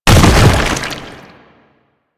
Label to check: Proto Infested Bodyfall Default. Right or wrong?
Right